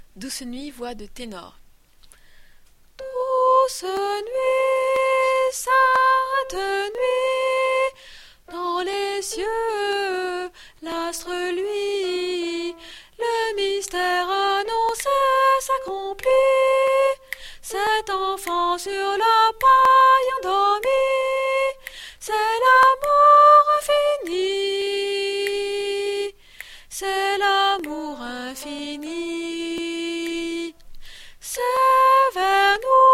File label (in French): Tenor, voix par voix Noel; Douce Nuit